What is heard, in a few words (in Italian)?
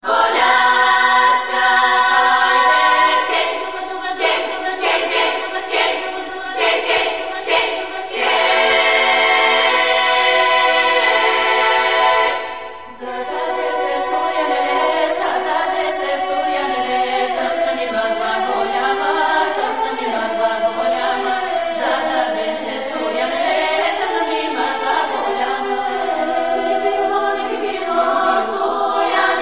Voci Bulgare, Esempio ritmico e armonico; Cori Polifonici; guida ascolto